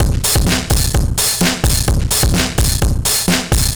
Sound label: Slack Drums A, 128bpm, Drums n Perc, analogue circuit; musicradar